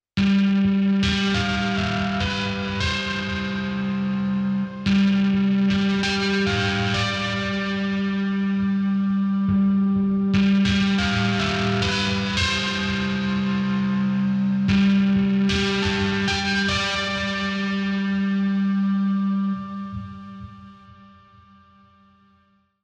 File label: CP70 Power Chords; Arturia V Collection X